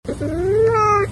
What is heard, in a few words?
meow